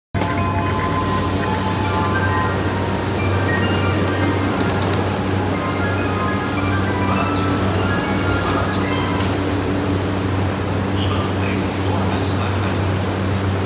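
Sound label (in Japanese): JR山手線原宿 clockwise, train sounds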